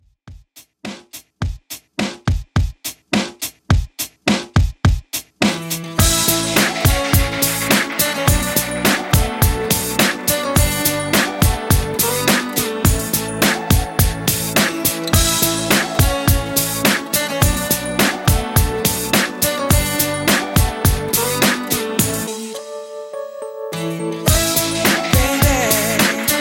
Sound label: Classic Redrum 105Bpm; djyan